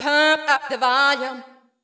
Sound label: VOICE GRL HOUSE 125 A, House Voice